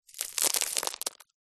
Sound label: stones and dust handful drop sprinkle; zapsplat; foley